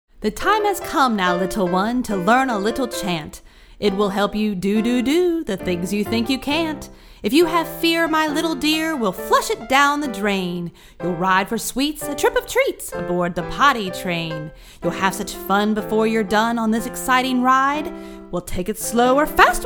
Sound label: the potty train chant